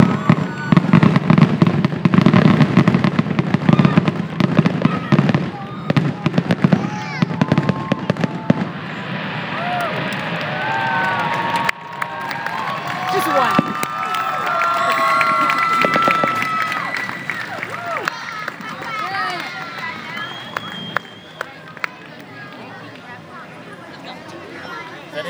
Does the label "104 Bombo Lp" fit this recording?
No